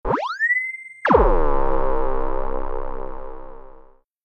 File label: Recharge